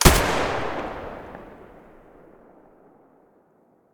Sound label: ak105 muzzle stereo, weapons; STALKER; IWP